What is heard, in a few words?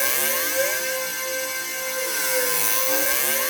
SYN, Drum & Bass, 160 BPM